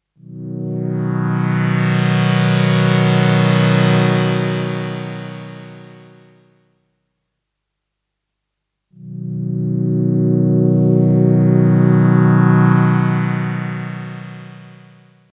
Envelope OB X OB 8

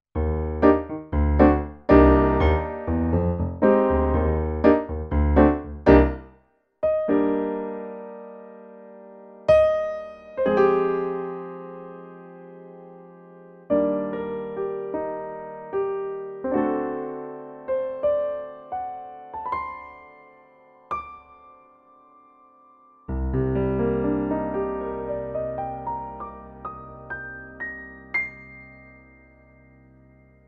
Roland FP-10 Piano

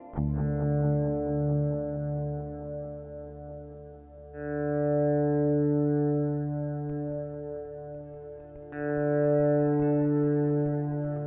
Swell Reverb Oil Can Delay; Source Audio Collider

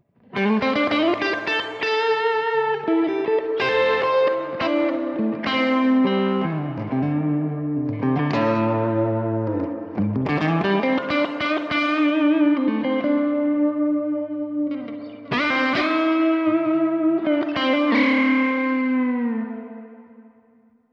Big Sky Reverb Spring

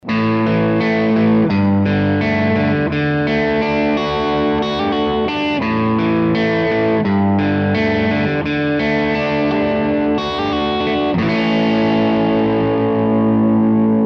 Epiphone Custom Les Paul Neck crunch

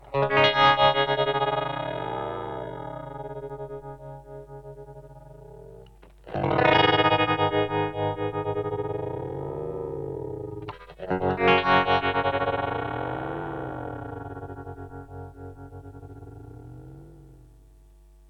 Radius Tremolo Ring Mod; Red Panda

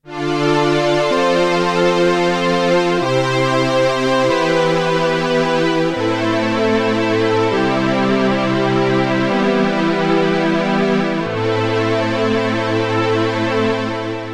Polysix Poly Strings

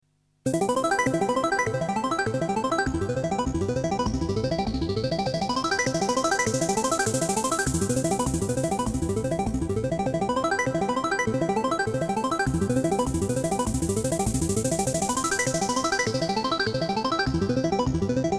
large church

